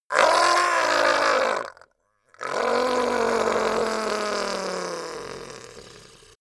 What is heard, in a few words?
goblin groan painful long; schreie